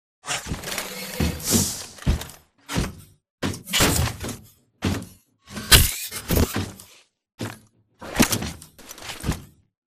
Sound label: Victory Drinking; Coliseum; Titanfall 2